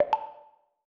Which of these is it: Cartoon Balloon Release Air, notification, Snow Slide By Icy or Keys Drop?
notification